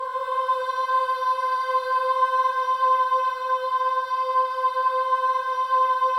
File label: BOYS AHS, Symphony of Voices